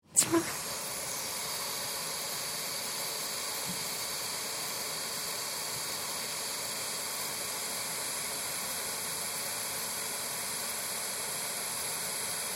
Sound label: Water Garden Hose Pipe Hiss Faucet On Run Off Long